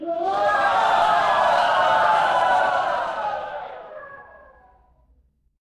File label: crowd cheer